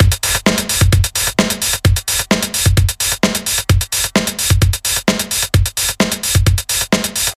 A fast paced and pretty hard hitting punk style drumloop (Kick tuned to F#); 130 bpm